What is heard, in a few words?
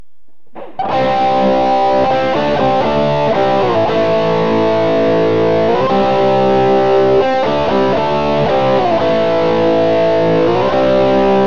bridge crunch; classic57; Burny